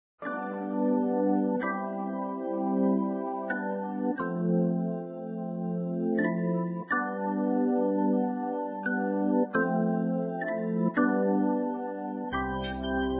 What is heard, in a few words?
Keytar; Zomboid; Lifestyle